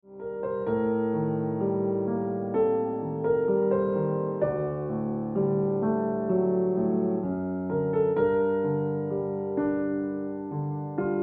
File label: Ringtone Piano Music